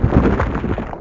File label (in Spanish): Esqueleto Sale; Grounch